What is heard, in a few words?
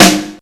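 SNARE, Hip Hop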